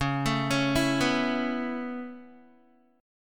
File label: C sharp Minor 7th Sharp 5th C sharp Guitar; C#m7#5 arpeggiated